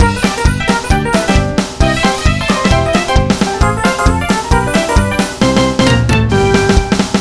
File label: pop, bgm; pictcode